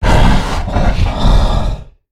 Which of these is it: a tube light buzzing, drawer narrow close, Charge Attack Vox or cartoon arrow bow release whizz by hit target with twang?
Charge Attack Vox